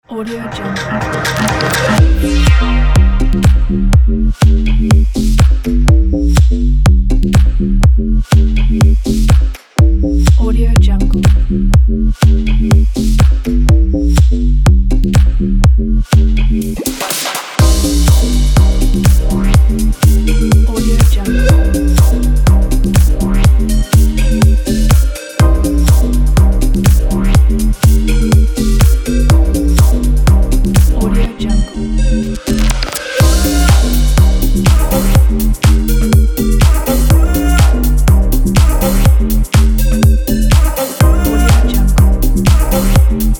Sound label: electro vibes